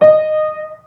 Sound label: high D sharp